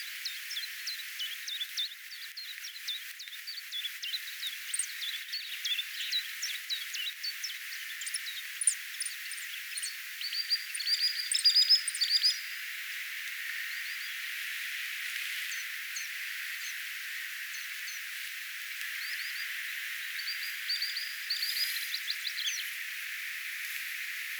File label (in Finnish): zulit-tiltaltin laulua erikoista puikkelehtivaa laulua rantasipin ääniä